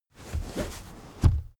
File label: car armrest backseat open